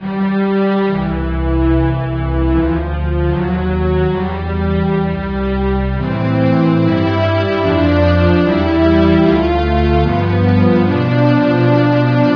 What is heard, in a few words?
win